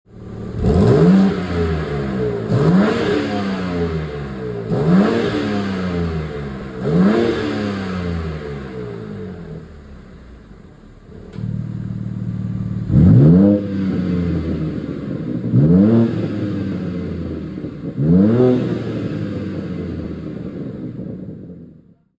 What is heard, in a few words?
Bmw m2 revs; 2024; g87 coupe 3 0 m steptronic rwd 460ps; frozen portimao blue